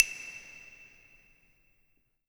clave wet; InVision Interactive; Percussion Slam

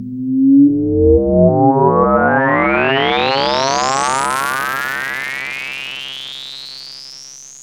ring mod sweep, VCS FX; Zero-G Ambient 1

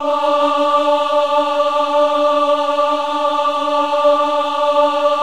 VIB D4, Symphony of Voices, VIBRATO; Spectrasonics

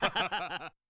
Ha Ho Hee; Yamaha DTXpress-II

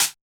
Yamaha DJX 2B, Hand Clap

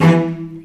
E2 AT SHT L, VCS ARCO, String Collection; East West; The Ultimate String Collection

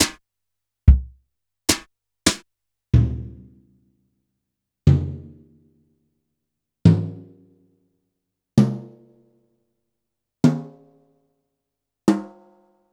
Yamaha PSR-E323 Brush Kit

